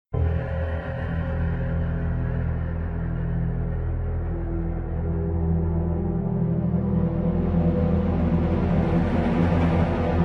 music; slender

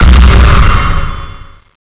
fire activation

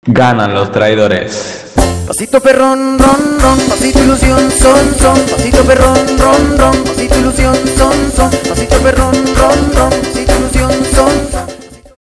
win; Cube

